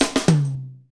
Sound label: Hip Hop, Drumscapes Roland; Northstar